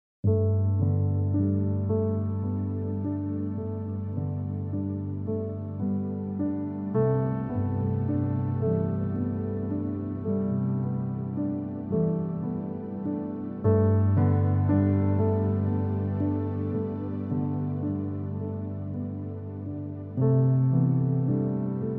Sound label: Ringtone